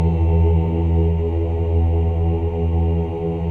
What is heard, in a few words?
CHOIR OOS E2; Spectrasonics; Symphony of Voices